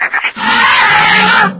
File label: scream, poisoncrab; svencoop; hl2